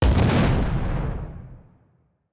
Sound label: kaiju titan hit explosion flash; Stellaris; biogenesis; weapons